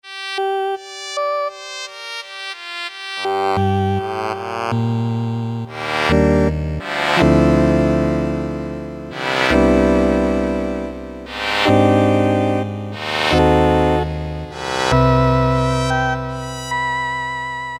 cosmic tone + envelopes, Casio MT-68; tweaked preset sounds

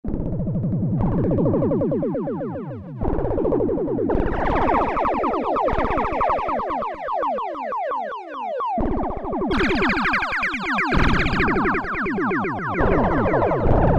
WHIPPETS, Oberheim OB-8; Factory Patches

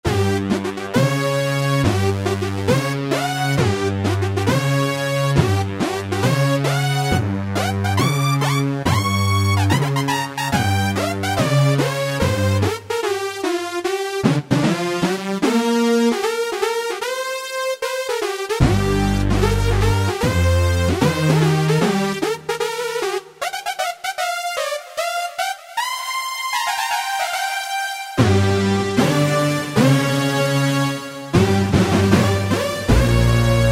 Fanfare, Alesis QS8; Factory Preset examples

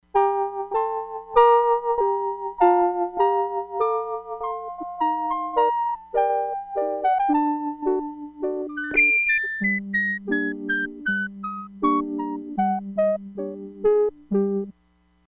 PIPE FLUTE WHISTLE, Crumar Bit 99; Factory Programs

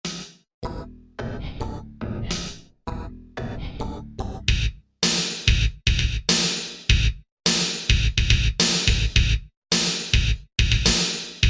Gate Music, Korg MSC-1S; Korg MSC-1S Variety

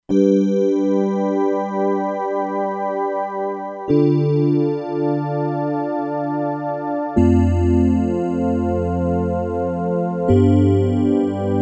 Bronze Pad, E-mu Proteus-2; Factory Presets

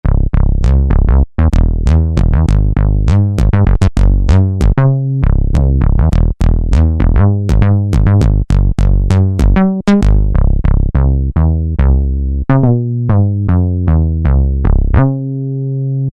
Compu Bass; Roland SR-JV80-11 Techno Collection

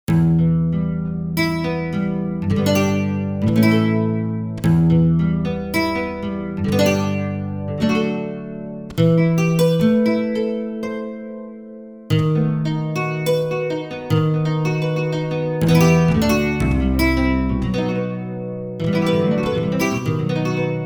Nylon Gtr, Roland JV-880; Factory Patches

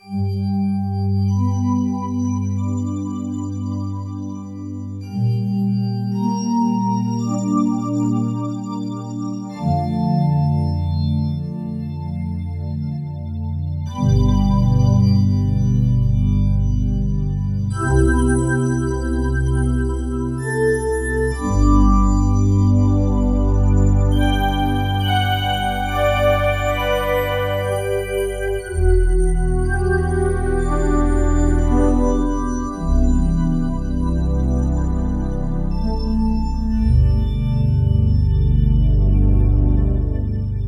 D1080 Pad, Roland VC-1

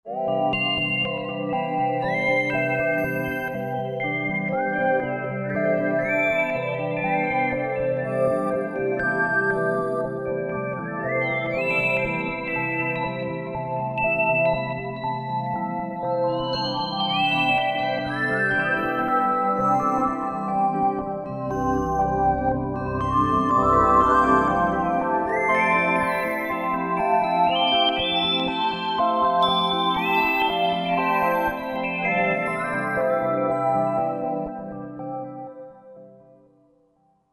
Strobe Mode, Roland JV-2080

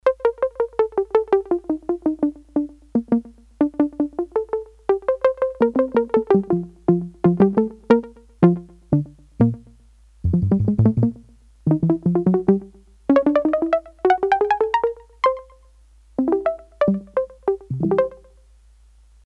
Pizzicato, Korg DS-8; Factory Patches

